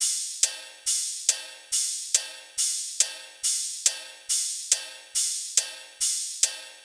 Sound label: Riddim Drum Loop Top (140); Drum Loops; Ghosthack; Riddim Essentials 2020